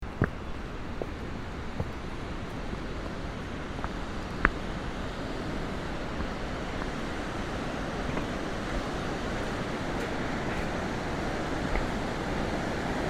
suspension bridge

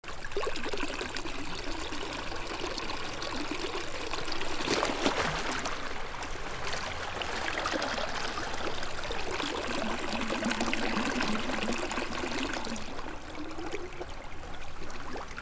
Maine Shorebreak

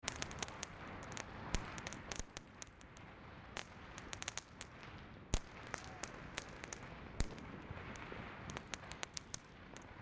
Beijing New Years

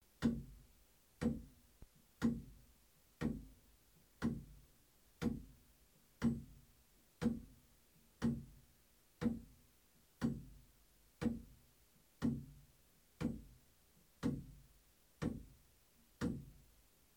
Jura Carillon

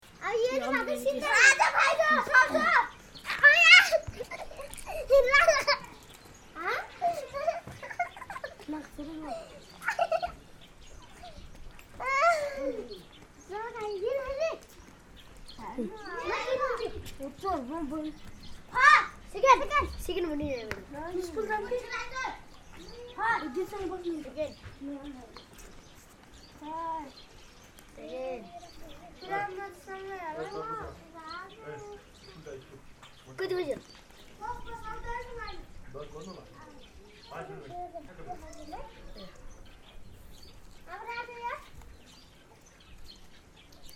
courtyard, nepal; Marpha

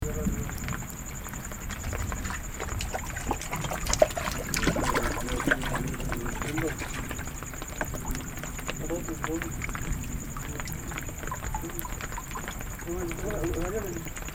backwaters, india

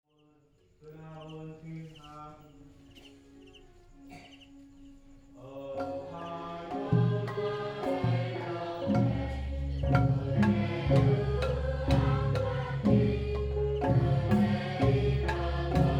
Kon Tum Vietnam Bahnar Easter Celebration

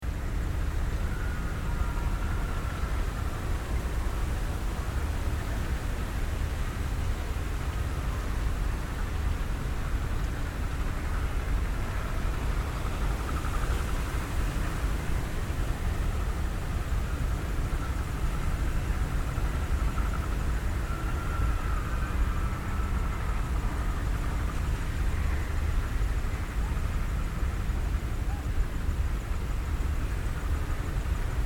killing tree, cambodia

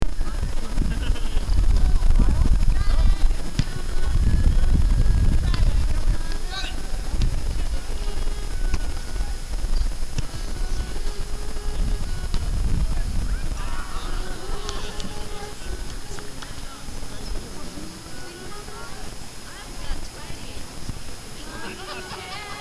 Dockside Violin, Lake Champion NY

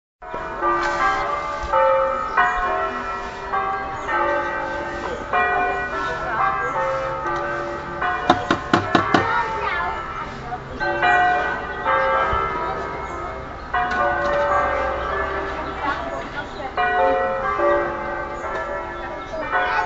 churchbells; Domaso

